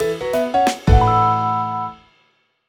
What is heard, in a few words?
Jingle Trump Finish; clubhouse games 51 worldwide classics 2020 switch gamerip